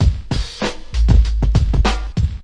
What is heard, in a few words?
thummmm, drum loops and breaks, 099 bpm; 091 to 100 bpm